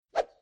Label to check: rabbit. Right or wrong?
Wrong